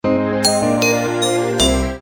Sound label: shutdown; Plus GSM Poland